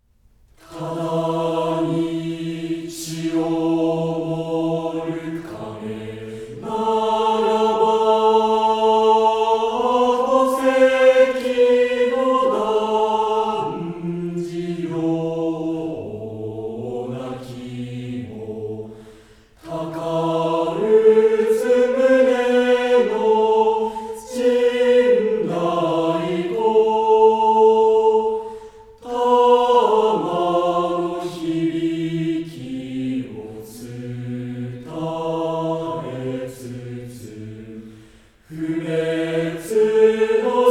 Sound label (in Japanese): 混声合唱; チャカホイ節